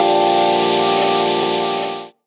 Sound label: PAD SHUSH; Rawcutz; Nu Forms